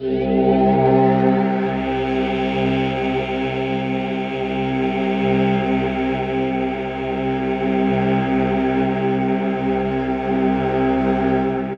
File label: Pad; Dream Zone